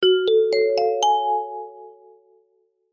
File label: musical retro classic vibraphone mystery tone; zapsplat